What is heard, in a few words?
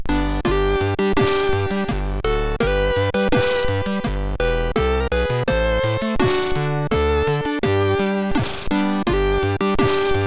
o christmas tree sid MOS6581